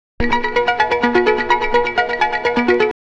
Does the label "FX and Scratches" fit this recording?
Yes